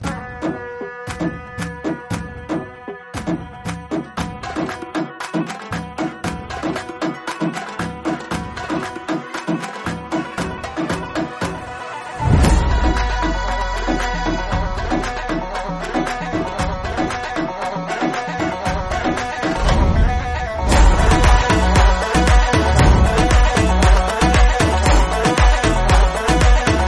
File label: Instrumental